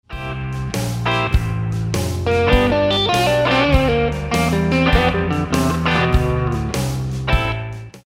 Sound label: Reconsider Lick, guitar